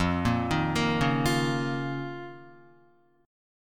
F 7th Suspended 4th Sharp 5th F down Guitar; F7sus4#5; arpeggiated; JGuitar

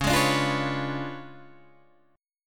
C sharp 7th Flat 9th Guitar Open D, chord

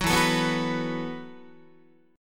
E Suspended 2nd Suspended 4th E, down, Guitar; strummed; JGuitar

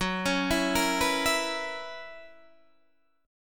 Gb 11th, Guitar, Open D

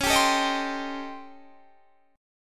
A 7th Sharp 9th Flat 5th

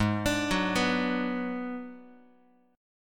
G sharp Minor 7th Flat 5th, Guitar; arpeggiated; x,4,5,4,3,x; 4A,4E,5A,5D,5G#,6C#

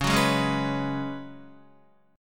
Db 7th Suspended 4th Db down Guitar; strummed

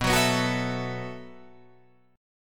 B 7th Suspended 4th B down Guitar Open F; B7sus4; strummed

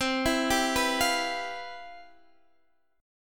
C Major 11th C, Guitar Standard; CM11 arpeggiated; JGuitar

